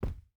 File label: footstep rubber boots cloth jump land; Rust